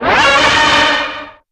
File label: cault hit; combat; enemy